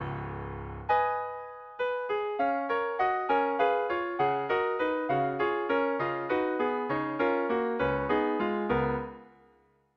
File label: synthese